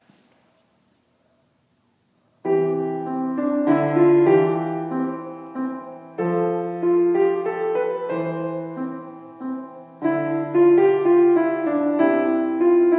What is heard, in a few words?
minuet bach G mayor